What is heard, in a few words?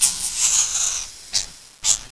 shock angry; shockroach